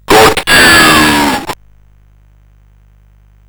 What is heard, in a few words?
sid CSG8580R5; Out on a Limb